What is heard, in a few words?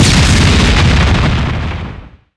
explosion